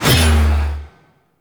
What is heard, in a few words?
Execute Cast; Warframe; PaxDuviricus